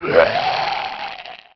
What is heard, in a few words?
zombie attack, male